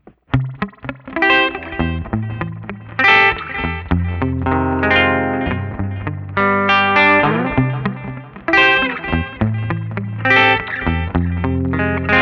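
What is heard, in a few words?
Rockabilly Bridge, guitar; Guild Starfire III; 1966 Guild Starfire III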